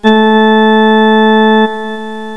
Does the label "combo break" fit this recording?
No